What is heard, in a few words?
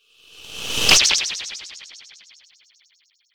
impact